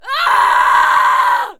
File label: human female, Screams Shouts